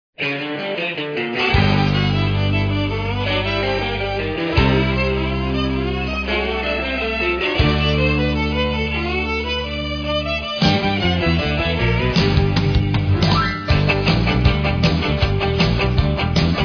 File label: Boogie Woogie Fiddle Country Blues